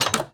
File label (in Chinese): 铁门打开声